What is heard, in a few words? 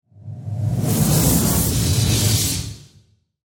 Mechanical Metal, Mechanical, Metallic, Parts, Swirl, Transforming, Robot, Production Element, Imaging Element, Accent, Transition; Robot Sound Effects; Blastwave